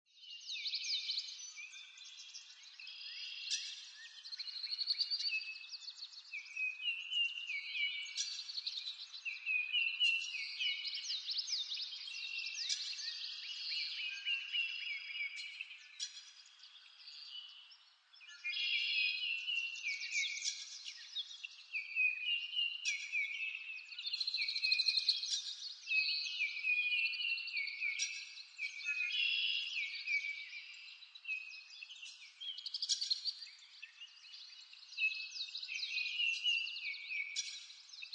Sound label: Nature Ambience Birds Farm Morning, Very light peaceful outdoor countryside ambience; Environmental